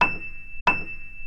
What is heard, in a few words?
E7 forte, piano sound